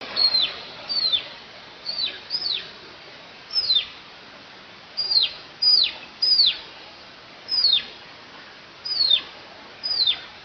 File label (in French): piou